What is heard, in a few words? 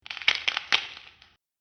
Bone Break, injury; combat